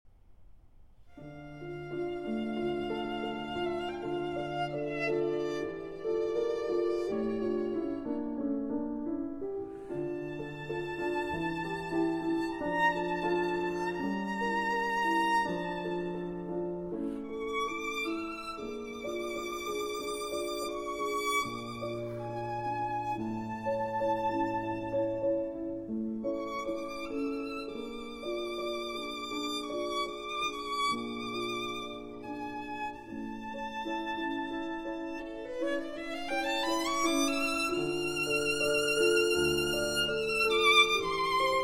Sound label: Violin and Piano; Gluck; Melodie from Orfeo ed Euridice, Wq. 30; Arr. Kreisler